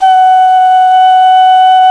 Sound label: Clay Flute